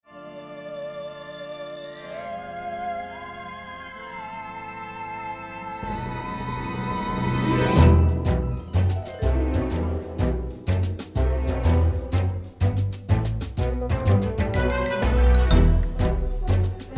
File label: halloween song instrumental; disneyjunior